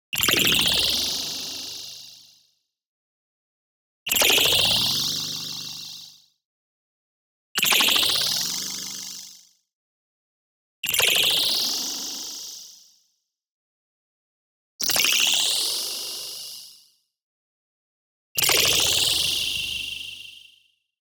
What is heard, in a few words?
Particle Rising; BLING; boom library; Anime Essentials